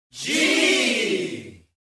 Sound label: Crowd